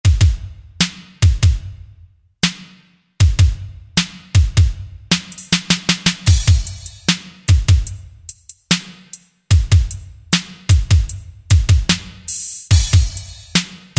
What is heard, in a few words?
Drums, Akai SG01v